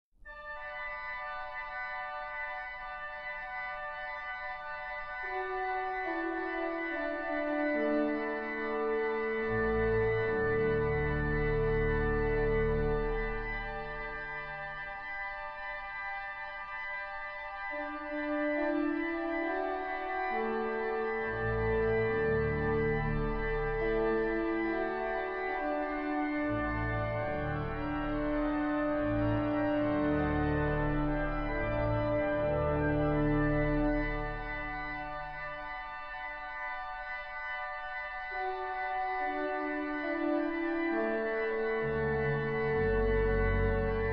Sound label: Carillon de Westminster; von Vierne